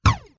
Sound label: Gun; RiptideSampleFPS